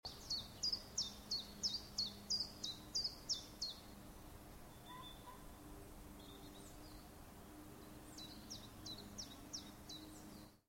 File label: chiffchaff